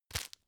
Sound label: tissue grab